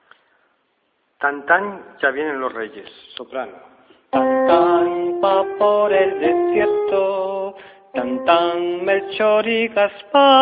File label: sopranos